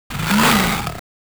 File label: roar; robocop vs terminator